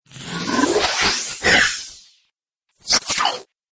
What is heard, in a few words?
Dubstep Scifi Effects